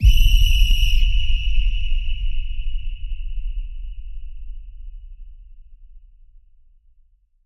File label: Ref Whistle Low Boom